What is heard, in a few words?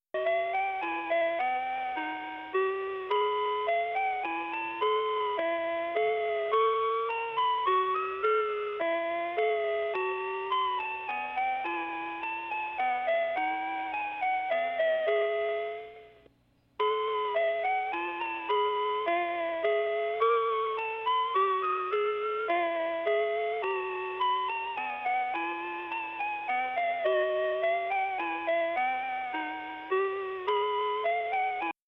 Menuet In G